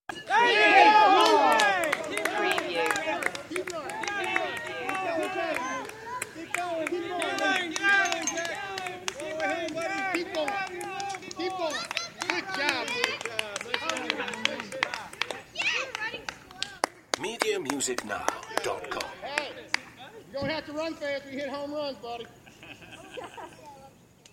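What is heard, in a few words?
Little League Hit Clap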